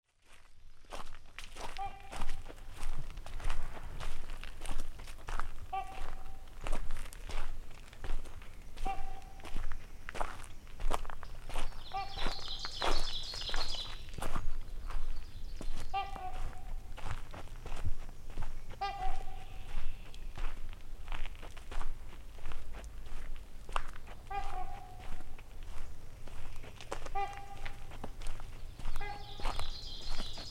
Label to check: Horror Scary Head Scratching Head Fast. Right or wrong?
Wrong